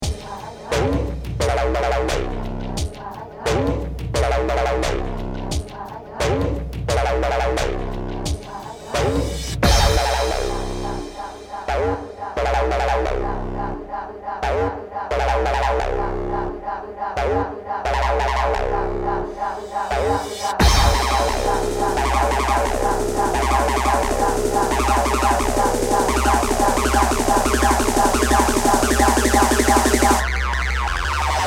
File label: Tekno Chant